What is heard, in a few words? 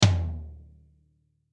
Drum Hit Tom Low; Drum Kits; Blastwave